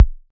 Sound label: Kick, Dubstep 808 Kicks; GhostHack